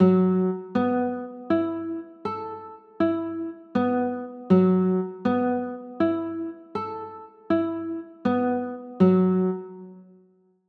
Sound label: f sharp m11